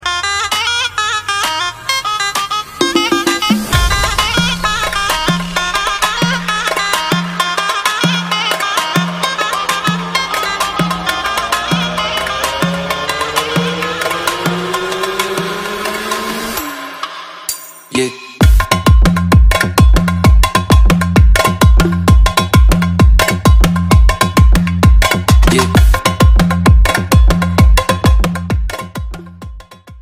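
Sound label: debka instrumental, Israeli Dances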